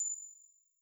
Windows Ding; GMM Windows 10

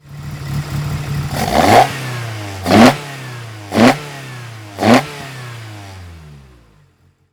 Exhaust Note; Initial D Final Best Collection; Initial D Music Lossless Collection

